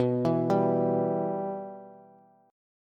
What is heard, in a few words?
B Suspended 4th Sharp 5th B Bass; Bsus4#5 arpeggiated; JGuitar